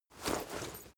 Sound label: leather metal walk slow single